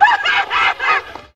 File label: laugh clown; ooh ahh